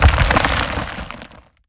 Leap Attack Impact Debris; Evolve; Goliath

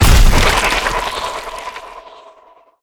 Ability Gooberball Impact; Lurker; Evolve; Gorgon

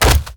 Collisions Bodyfall Soft; Lurker; Evolve; Gorgon

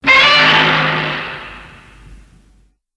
Roar; Shin Godzilla

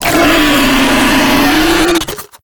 Acid Spit; Lurker; Evolve; Gorgon

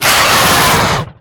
Harpooned; Evolve; Wraith

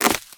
Climb Claw; Evolve; Goliath

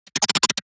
Lurker Emote; Evolve; Gorgon

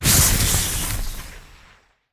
Lightning Strike Aim Interupted; Evolve; Elder Kraken

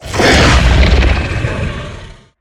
Sniff, Behemoth; Evolve

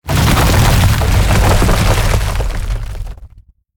Rock Throw Grab; Evolve; Meteor Goliath

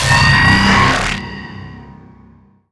strider alert; Strider Remake

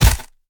Collisions FS Slow; Lurker; Evolve; Gorgon

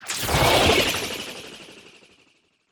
Sniff; Evolve; Wraith

